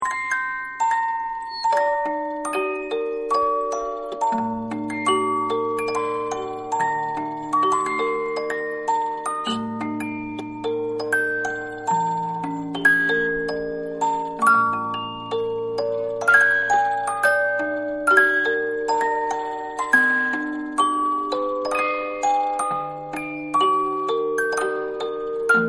Music Box Run And Stop, Music Box-Running Down And Stopping; Toy Melodies; Toys; Manhattan Production Music